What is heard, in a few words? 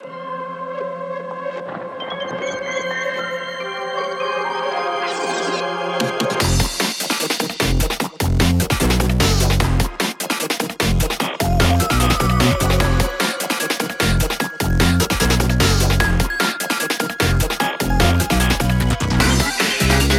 (Spooky Mix) (Instrumental)